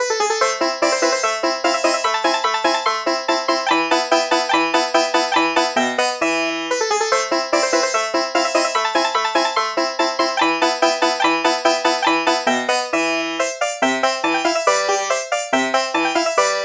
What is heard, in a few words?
sitar mozart